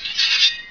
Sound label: sword from scabbard; attack; heroic